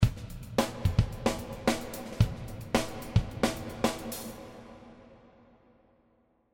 Drums Dreamy; Rumour

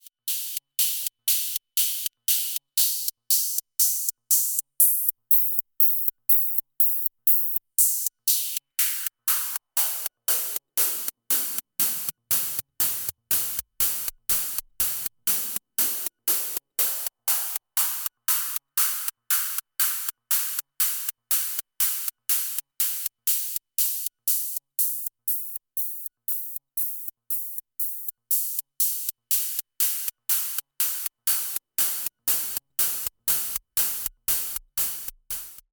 twin VC Filter HP Filter

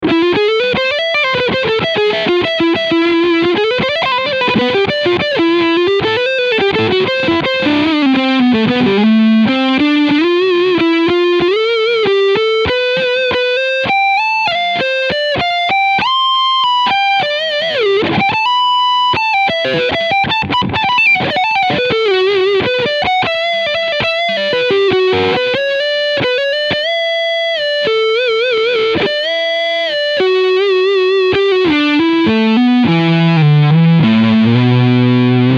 Ibanez RG657 Leadsound Front Pickup

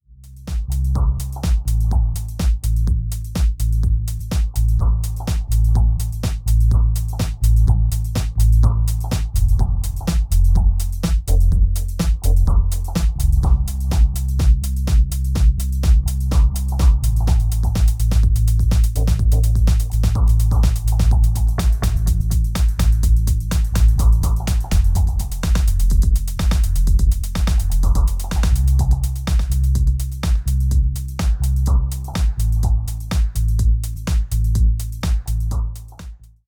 delay

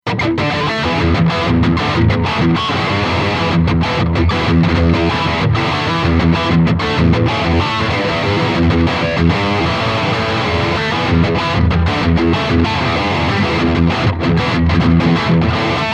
High Gain

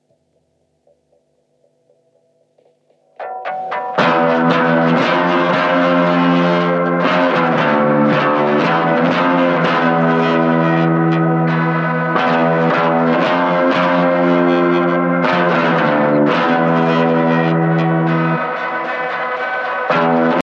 drop git; tubetech

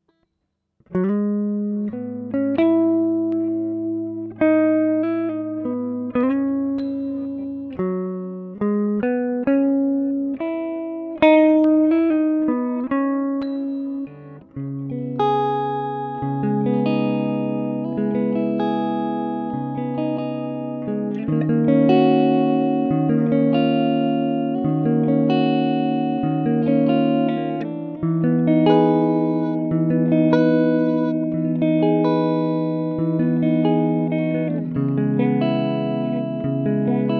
Reverse

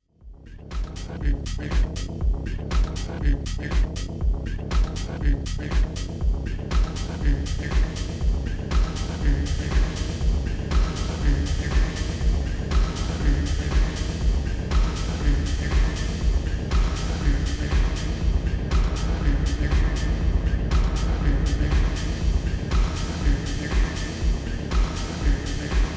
Concert Hall; Xone PX5

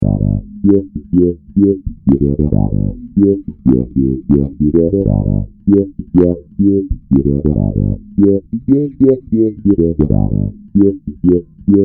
A Wah

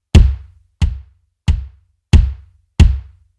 Funk Kick solo

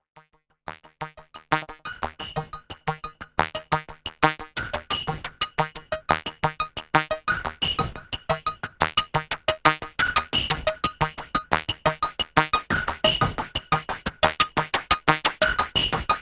770 NOISE 4 tracks

